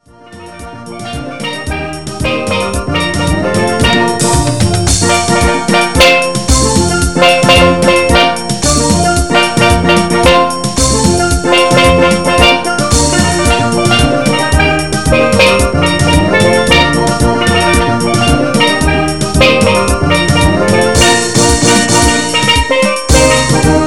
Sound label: Steel Drum